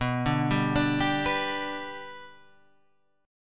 B Minor Sharp 5th; Bm#5 Chord {7 5 5 7 8 7} arpeggiated

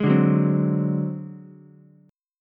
G sharp 7th Flat 5th G sharp down Ukulele, chord; G#7b5; strummed; JGuitar

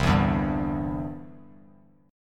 C-Major Add 9th, Bass Standard; strummed

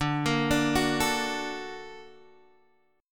Bb Augmented Major 7th D

